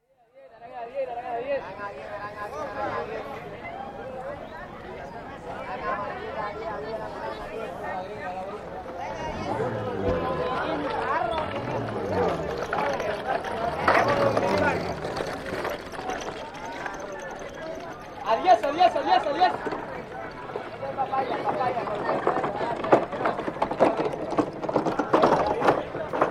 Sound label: Market, Cartagena (on Atlantic coast) cries of hawkers, carts, some traffic (including motorbikes); Stores And Mall; BBC